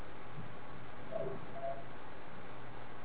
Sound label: unexplained sound